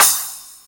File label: Cymbals crash; Vengeance Samples